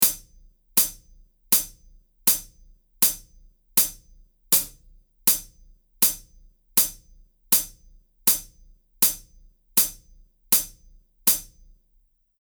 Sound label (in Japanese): Jazz ノーマル; Jazz講座用DEMO